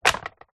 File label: sport flipper fin drop light on ground